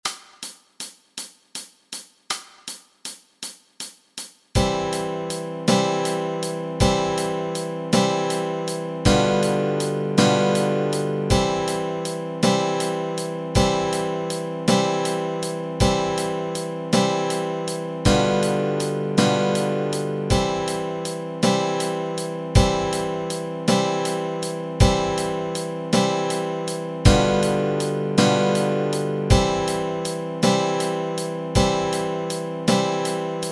Chords Track, Easy Guitar and Ukulele

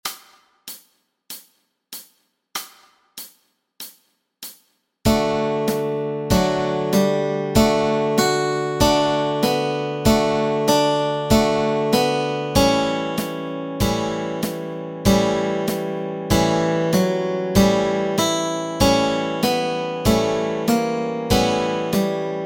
melody and chords, Easy Guitar and Ukulele

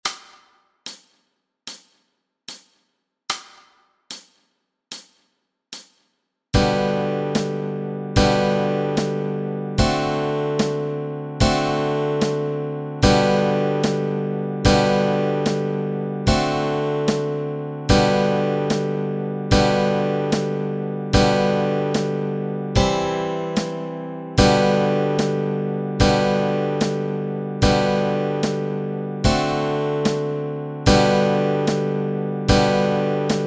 Chords Track, Easy Guitar and Ukulele

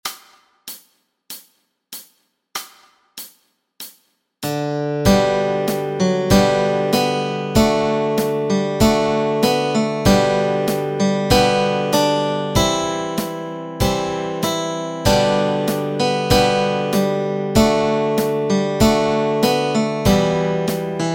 Auld Lang Syne melody and chords

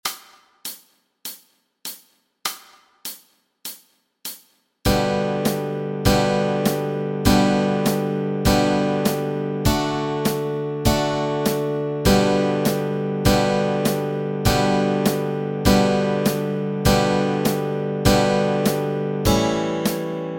chords

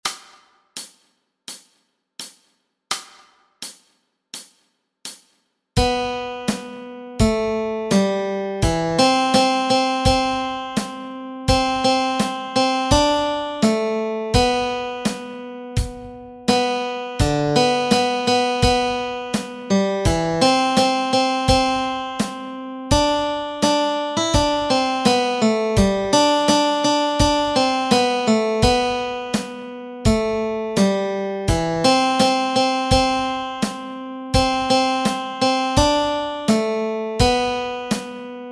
Melody Track

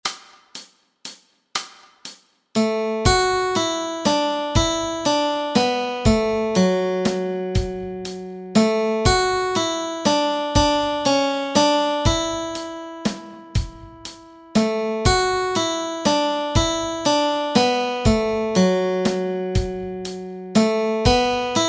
Melody Track

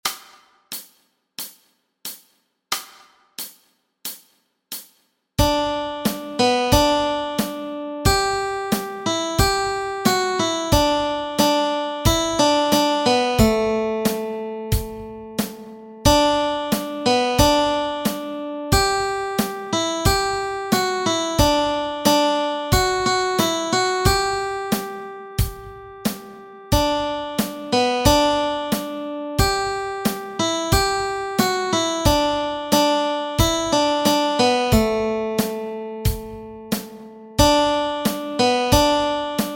melody

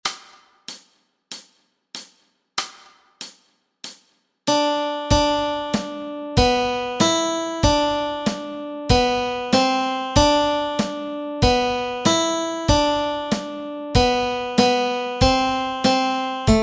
Melody Track

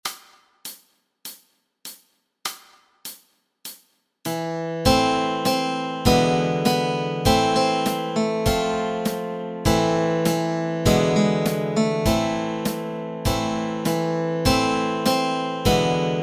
Go Down Moses melody and chords

